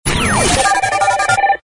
comm electrostatic ring

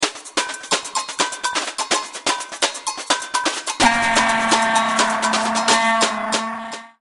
Vuvuzela Carnival

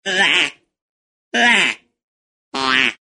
comedy duck quacks

